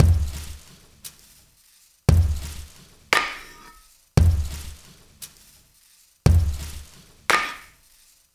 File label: 115, drum loop stones; FOREST STONE